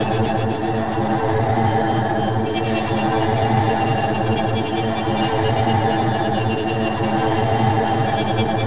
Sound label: Mourn Temple Amb; The Elder Scrolls III Morrowind GOTY; XBOX